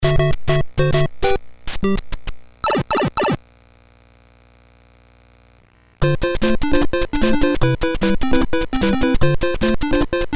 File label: sound famico